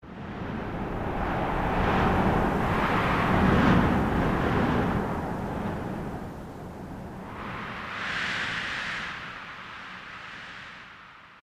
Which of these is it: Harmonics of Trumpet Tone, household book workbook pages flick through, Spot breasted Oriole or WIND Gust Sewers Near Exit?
WIND Gust Sewers Near Exit